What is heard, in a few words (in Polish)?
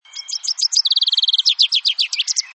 zięba - śpiew, inna zwrotka